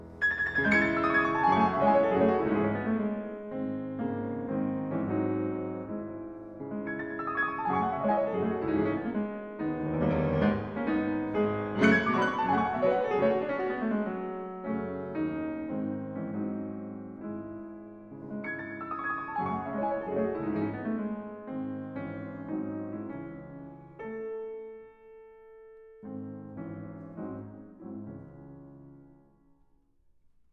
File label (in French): En Do Diese Mineur Allegro Molto, Piano; Chopin